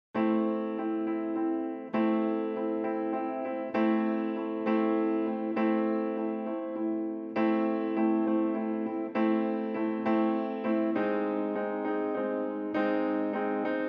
Music morse; dear esther

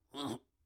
Zoteling yelp

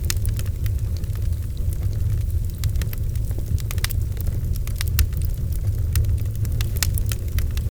Torch

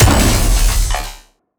Revolver Charged

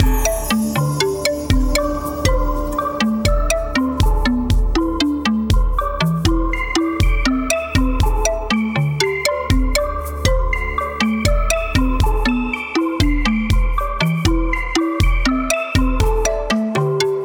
cave Song MUSIC outro; Castle on the Coast; PS4

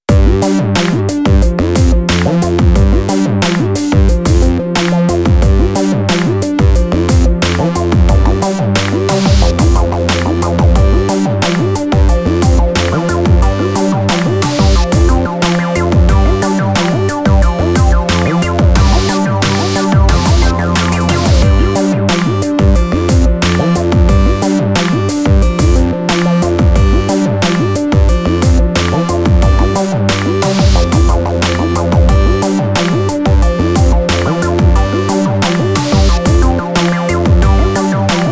POP TRAP RAP

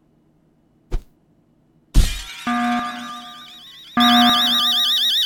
Drop; Stealing The Diamond; Catwalk

